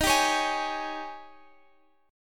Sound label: D sharp 11th D sharp, Guitar, Open F; strummed; x,x,15,14,16,15; JGuitar